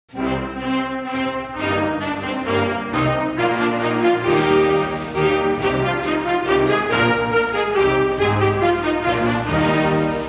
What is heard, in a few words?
Basse Danse; Capriol Suite